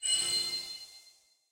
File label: button release; starshards